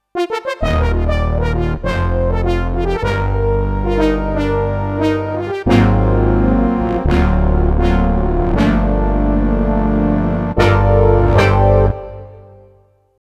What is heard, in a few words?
Synth Trumpet